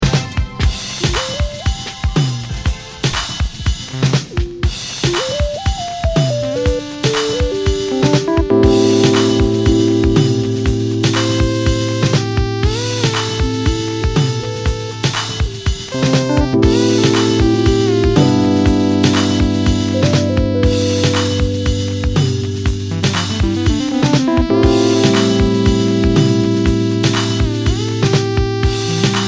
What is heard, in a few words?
Funky interlude